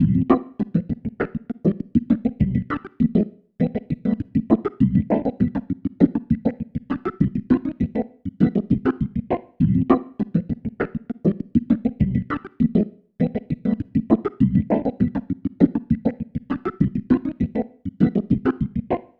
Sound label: Bubblin, Organ; Sonic Foundry (Sony Creative Software); Joe Vitale Organ Donor